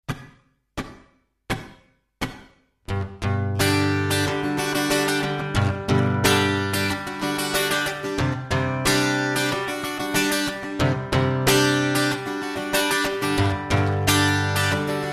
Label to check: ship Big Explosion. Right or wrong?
Wrong